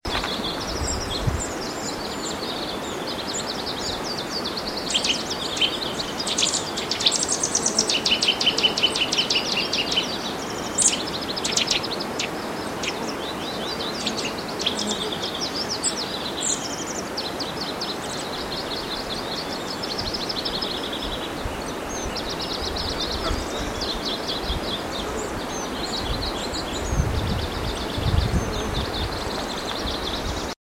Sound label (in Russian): pticy na bilyute